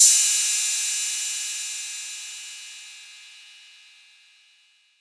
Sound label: Royality free cymbal sound tuned to the D note. Loudest frequency: 8706Hz; cymbal D key